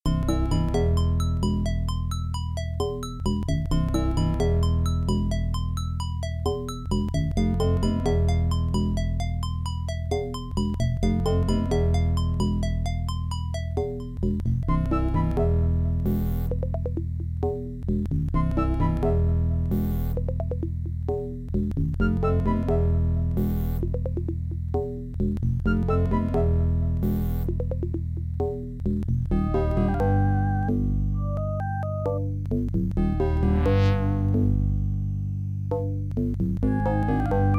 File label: Toy Factory, Adlib; Lollypop; MS-DOS